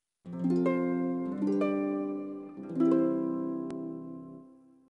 harper tune; dragon riders chronicles of pern 2001 dreamcast gamerip

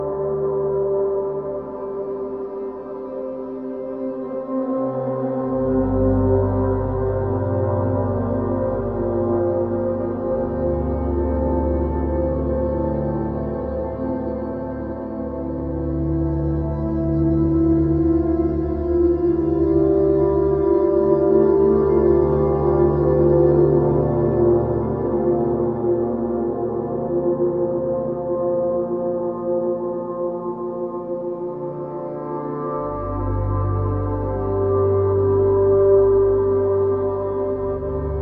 Melancholy Ambiance; hiberworld android ios online windows gamerip 2019

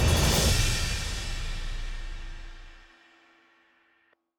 Music Boss Hideout transition Cymbale Transition; Sifu

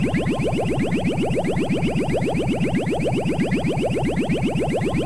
energy force spell loop

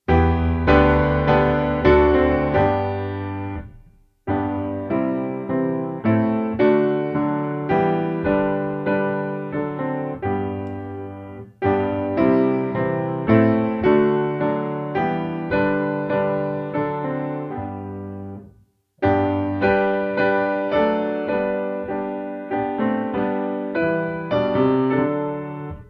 Piano; Assassin's Creed Syndicate

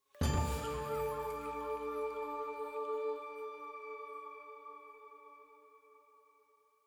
item Jingle Full Choir Flute D

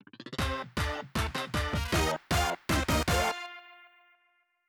JGL Mission Stage Crater Clear

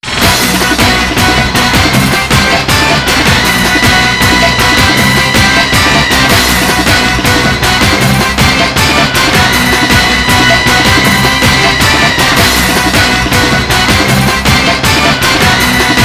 BGM Loop; Exit; PSP

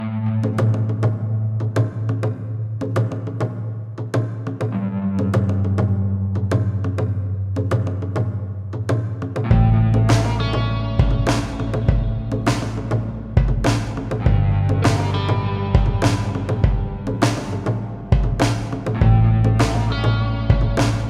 music level

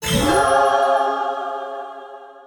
Worldmap Level Unlock; rakoo friends wii u 2015

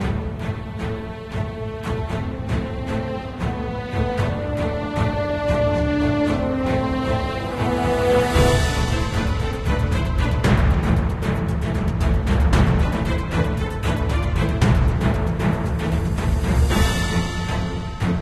Music Battle; Brave Fighter Demon Revenge; Android

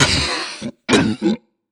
troll monster cough; Infernal Radiation

